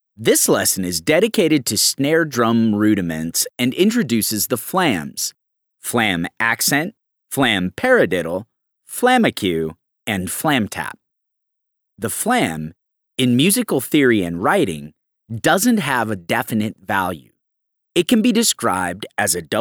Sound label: Flams